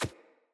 Pack Wolf Step Single; Animals; Footsteps

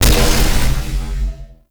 Void Dragon Body Ring Impact; Enemies; Abilities; Warframe; Duviri

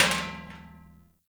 Metal Trash Can Impact; Warframe; Ambience; Nineteen Ninety Nine

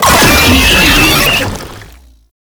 Proto Infested Brachiolyst Death, Vocals, Enemies; Warframe

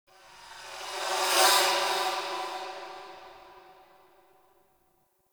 Sentient Lair Spirit Chatter Two A; Warframe

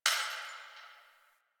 Distant Glass Breaking

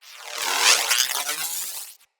Voltaic Sword Projectile Whizz By; Characters; Enemy; Abilities; Sinecure; Banneret

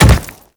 Step Default; Warframe; Grineer Leto

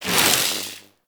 Sniper Zoom Out; Warframe; Sentient; Caliban Prime

